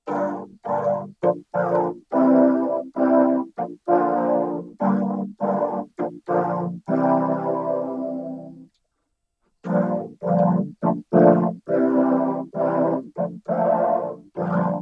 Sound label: Vocoder Chords